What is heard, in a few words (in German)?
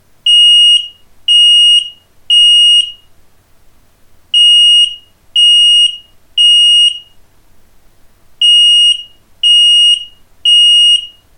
abus rauchmelder alarm; grwm30600